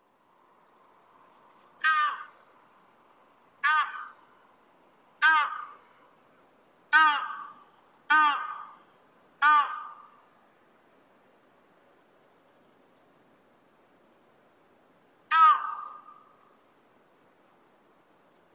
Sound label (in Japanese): toki, sado